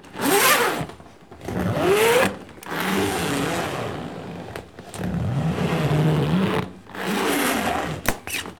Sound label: zipper upclose